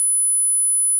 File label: 10 Khz tone